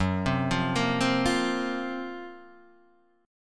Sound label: Gb Major 7th Flat 5th, down, Guitar, Half Step Down